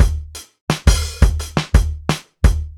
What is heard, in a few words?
funk 86; funk loops